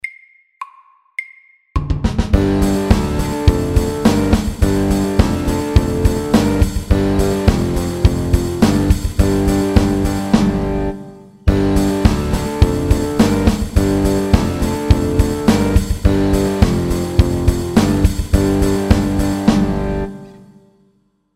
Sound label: Mountain rock FAST 105